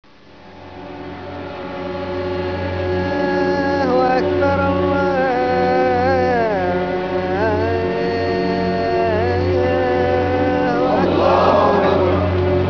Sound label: pray; bahrain